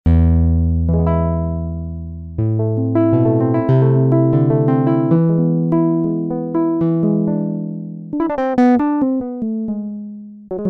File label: GUT GUITAR, Korg DW-8000; Factory Patches